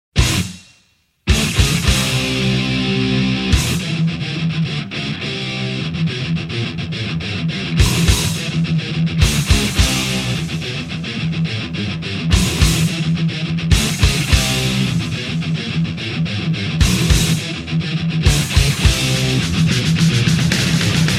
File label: Master Metal